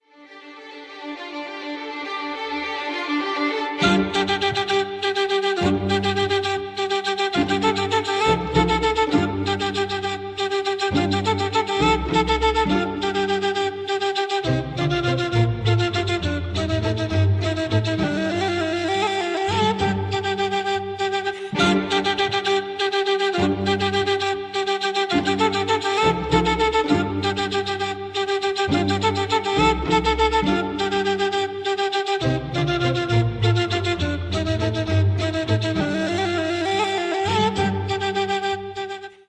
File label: Ney